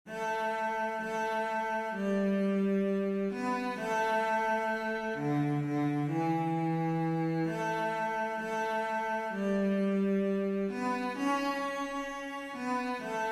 String Quartet

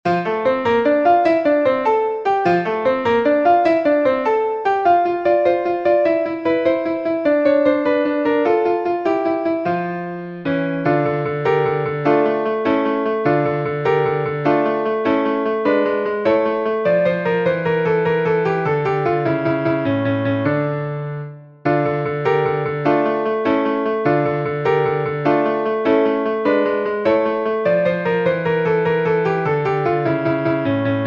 Piano F Major

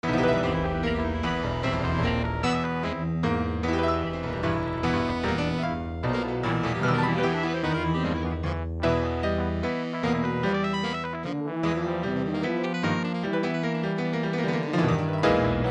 computer orchestra; extravagant alien concert etyde

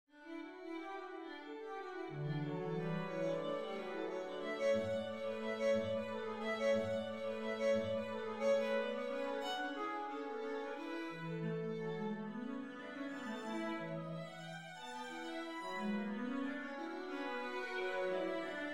Invention; Invention no. 4 (SQA)

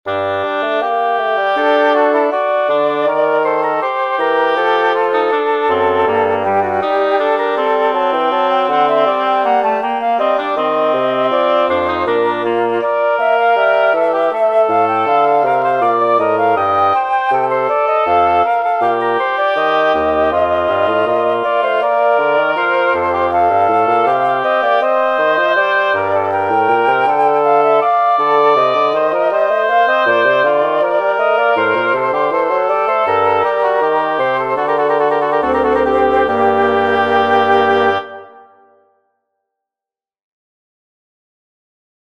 Trio and canon

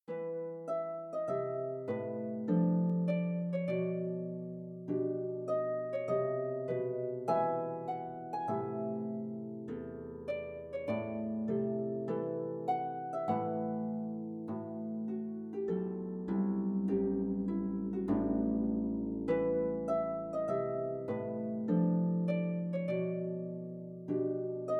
Soliloquy for Harp

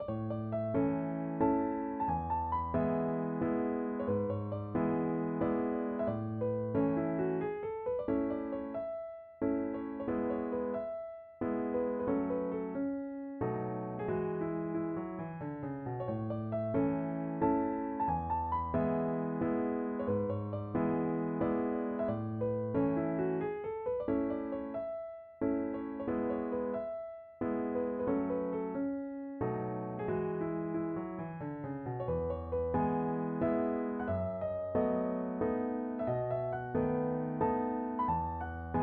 Minuet in A M

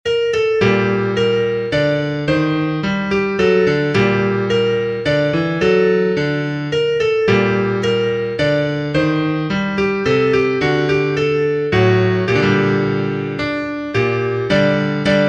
Minuets for the Piano; 26 Minuets for the Piano

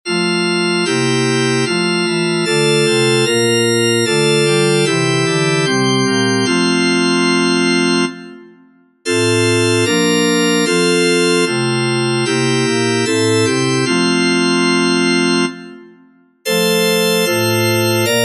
Chorale

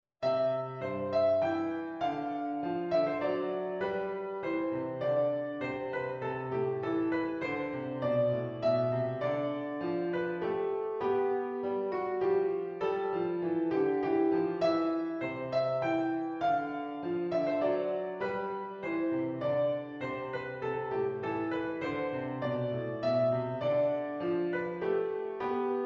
Minuet

